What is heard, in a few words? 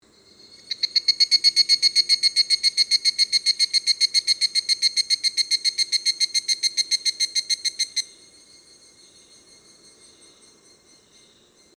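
cicada higurashi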